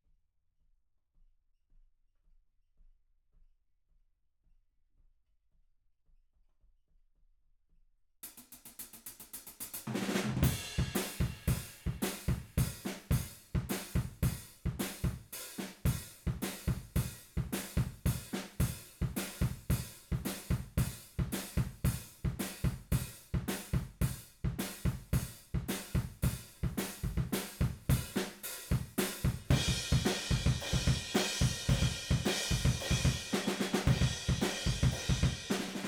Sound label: Room